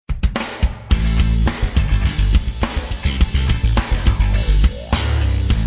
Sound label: Slapfunk